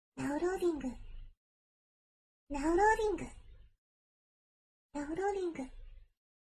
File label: now loading